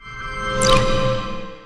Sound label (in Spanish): magia; alquimistas